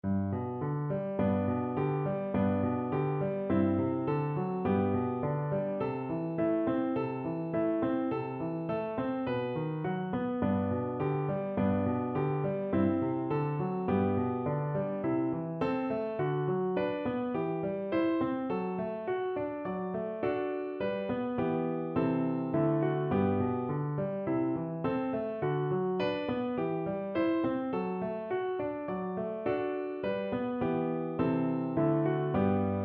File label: skips of 4ths medium voice accompaniment; Grade 1; Lesson 2